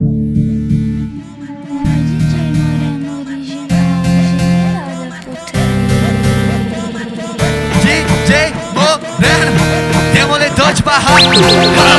Phonk

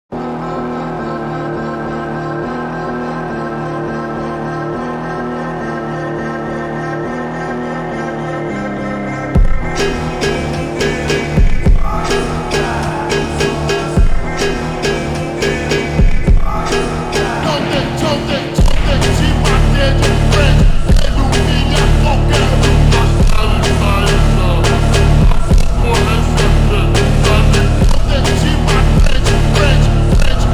Brazilian Funk slowed Reverb

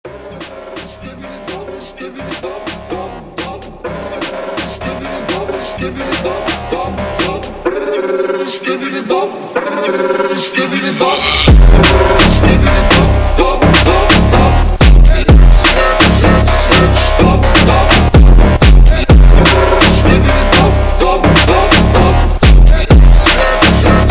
phonk; Skibidi Toilet

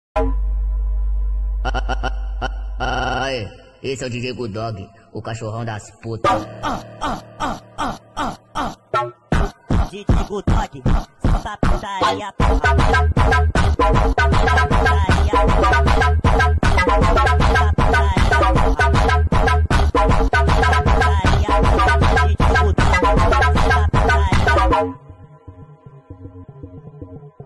Phonk Sped Up

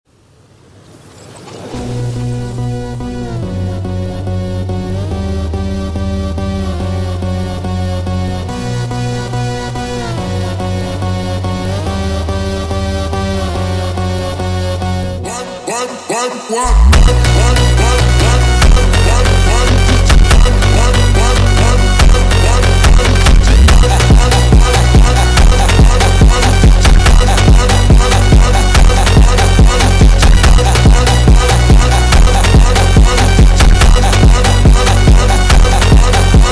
Funk sped Up

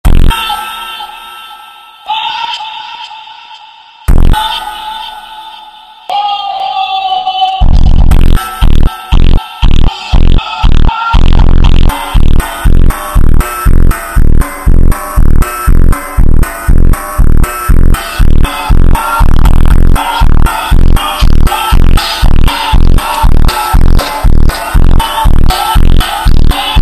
Funk slowed

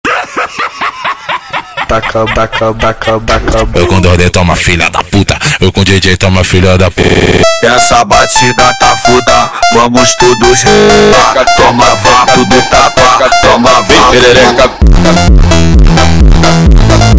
Risada bassboost

